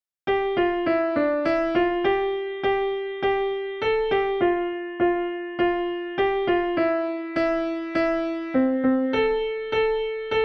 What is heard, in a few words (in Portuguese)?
melodia